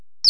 chirp

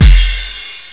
BASECYMB; BAS PERC; eXtended Instrument

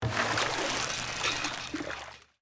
fireman; PHARAOH

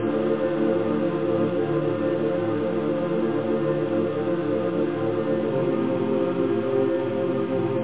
monks, whoosh

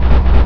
VIB MIX; CHASM

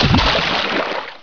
in h2o; Hexen2; raven

